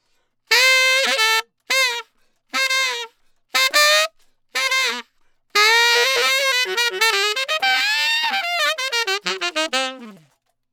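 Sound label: 120 Trad Jazz Sax C major; Brass; Digidesign; Pro Tools; Pro Tools Essential Loops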